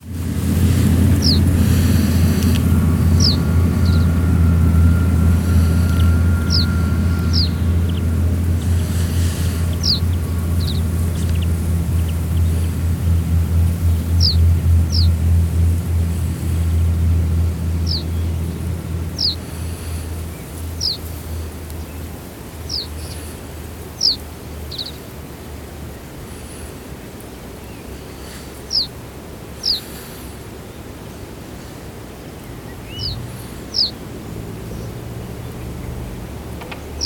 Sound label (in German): klanglandschaft